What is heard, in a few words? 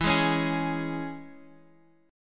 E 6th E, Guitar Open G; chord shape